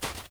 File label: fs snow, singlesteps